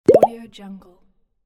plop button; kazoomx

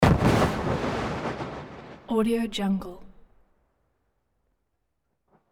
explosions car

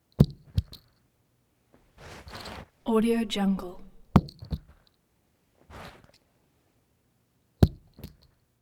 jewelry fall to carpet necklace thud; prosoundfx

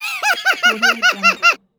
a cartoon laugh

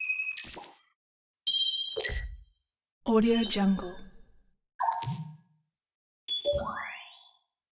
future weapons source sounds sensors; future weapons 3; soundmorph

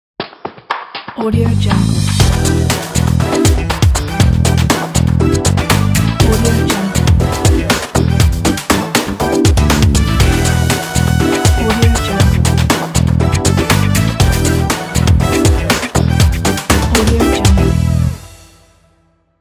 funky logo

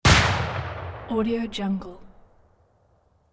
45 calibre rifle fires single shot; hollywoodedge

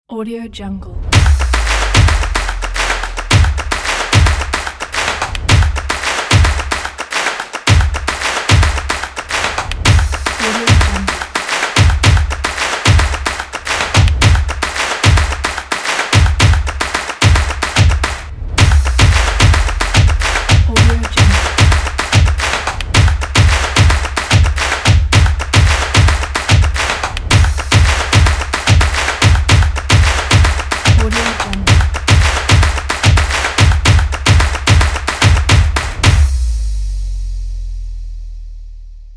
stomps in

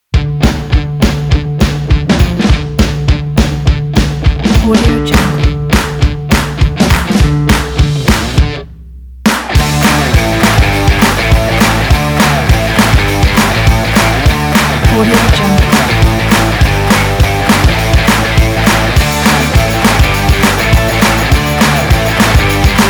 upbeat rock; nikosound

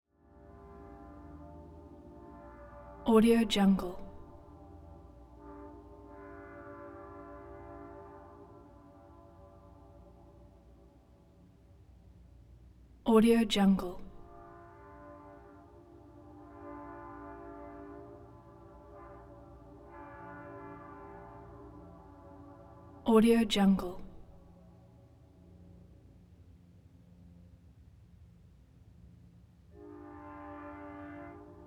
train horn

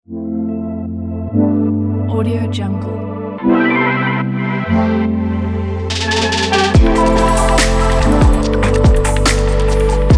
relaxation meditation

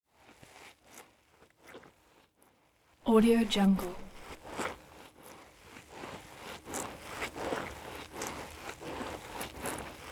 accelerating squishes some fluid sandy; prosoundfx

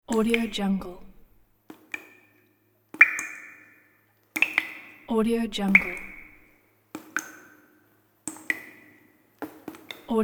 water drops

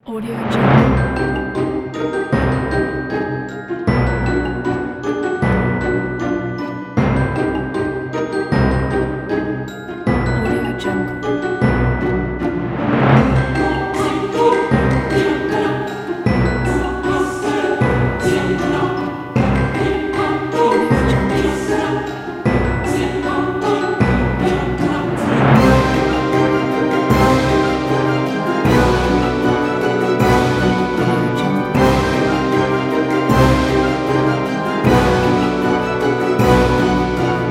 spooky halloween ritual; penguinaudio

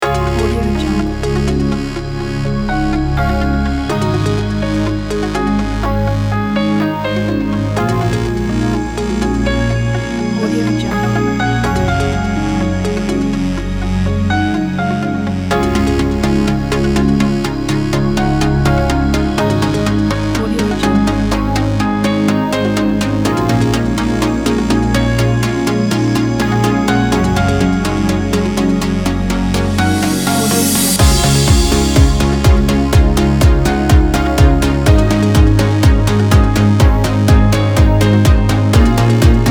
dream trance pack

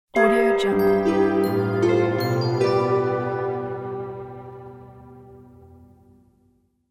fairy tale intro stinger

